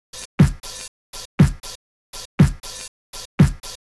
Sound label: NRG Percussion, Trance Drums